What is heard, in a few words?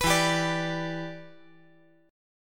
E Minor 7th, down